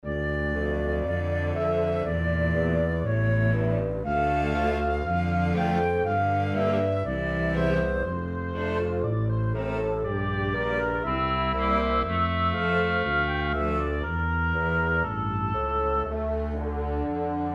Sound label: mellow minor